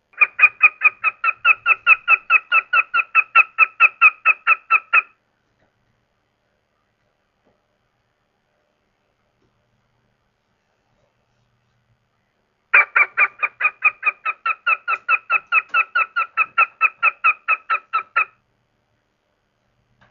Goshawk; Hawks; ORION

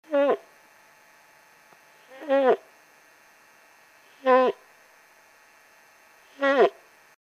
Young Bull In Love, Moose

